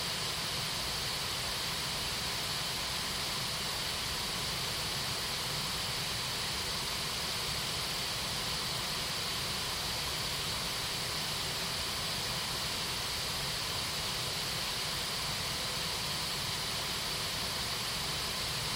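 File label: keyboard mouse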